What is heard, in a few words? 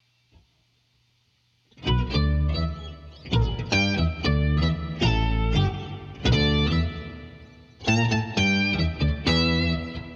multi octave groove guitar